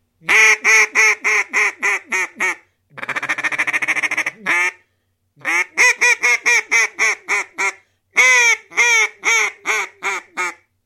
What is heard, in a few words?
Duck Call